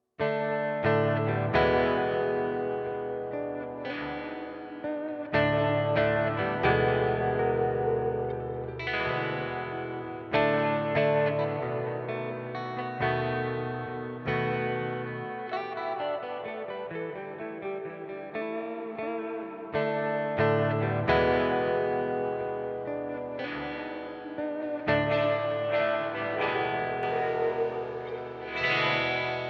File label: Spring Reverb EHX Oceans 12